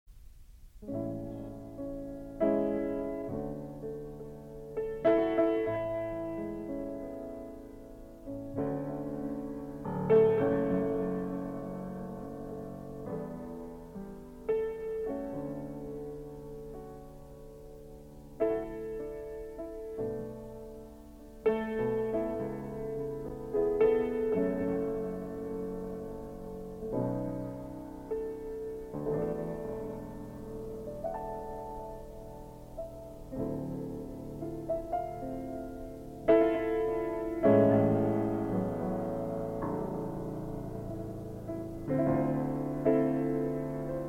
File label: piano fill